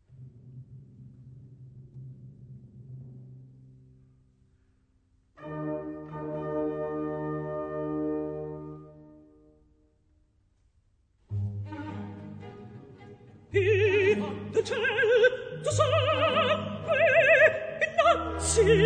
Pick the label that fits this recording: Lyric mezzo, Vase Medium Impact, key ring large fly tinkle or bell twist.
Lyric mezzo